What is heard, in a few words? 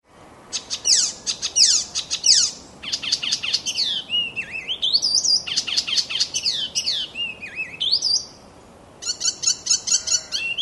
hippolais icterina; Animals